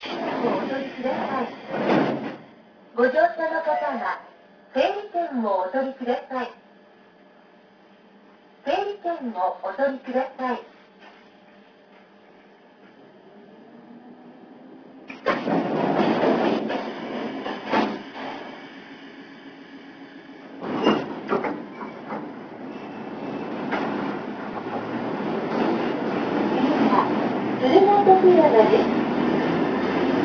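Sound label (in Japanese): 大井川鐵道312系Ｂ 青部駅出発時の走行音; 青部駅出発時の走行音（2000年2月26日収録）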